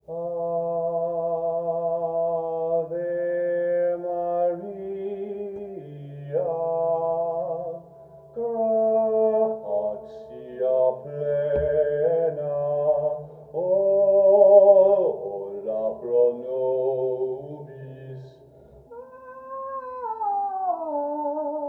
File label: Resonators Vocal Piano Case With Sustain Pedal Stereo